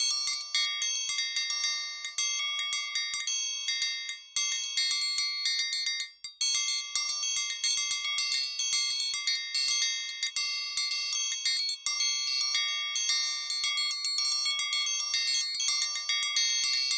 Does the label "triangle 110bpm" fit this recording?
Yes